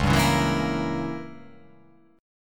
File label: C sharp Diminished 7th C sharp down